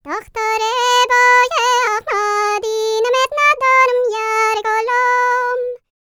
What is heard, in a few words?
PERSIA, World Voices, Vocal; ILIO; Vocal Planet VOL-5